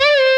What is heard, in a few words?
bend full release